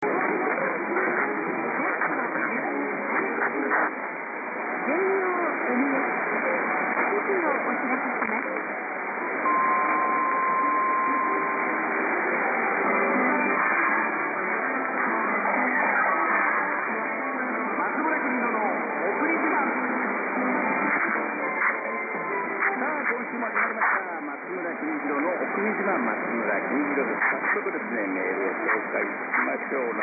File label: 936kHz